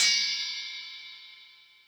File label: Cymbal, Hip Hop, 092, Dmin